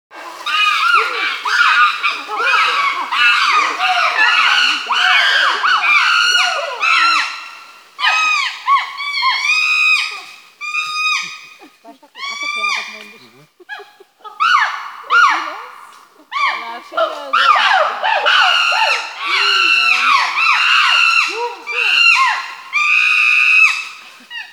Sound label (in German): Affengeschrei